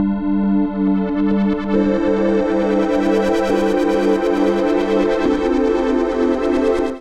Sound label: A Minor 137 bpm, Pads; MIDI Clips; Tonal; Rabbits Dream; Skitter and Step; Ableton Factory Packs